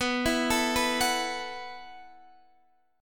B 7th Suspended 4th B down Guitar DADGAD

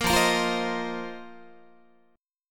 F 7th Suspended 4th F down Guitar; strummed

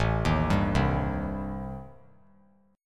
A-Minor 7th Flat 5th, chord; arpeggiated; JGuitar

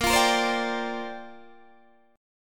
Bb 6th Add 9th Bb Guitar DADGAD

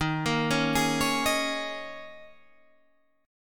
G sharp Suspended 2nd Suspended 4th, guitar; 11,13,11,13,14,11

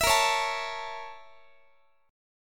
A sharp Minor Major 7th Flat 5th A sharp Guitar; A#mM7b5 strummed; x,x,19,20,17,20

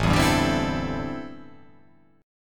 A Minor 11th A, Chord

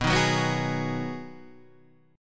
B 6th B, guitar chord

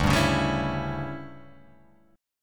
D Major 7th Suspended 2nd Suspended 4th, chord

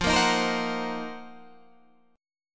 C Minor 7th Flat 5th F, chord; Cm7b5/F strummed; JGuitar

